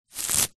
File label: grass pick pull rip from ground; zapsplat; foley